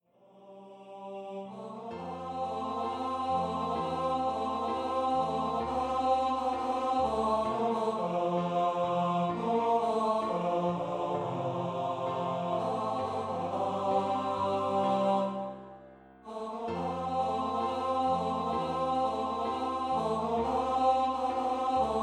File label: vocal duet for tenor voices